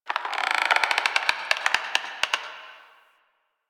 Tree Creak; Ambience; Warframe; Quests; The New War; Drifter Camp

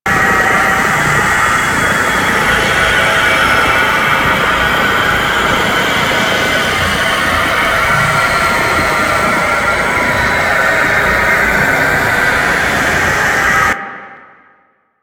Abyss Resource 3D Loop; Warframe; Abyss Of Dagath

